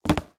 Book Hit; Game rip; MiSide

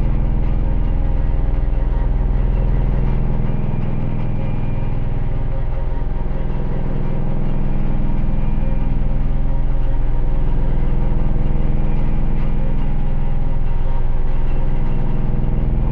Shok After; MiSide

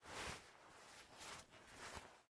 Take Ring; MiSide

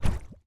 Step Lava; MiSide

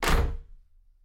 Door Close; MiSide

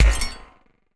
Sphere Take; MiSide

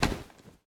Remote TV Drop; MiSide

